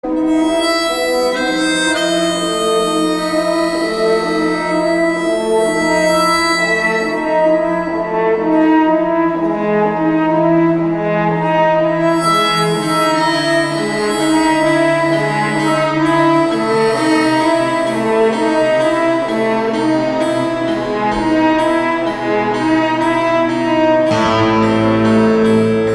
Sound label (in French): Homme harmonica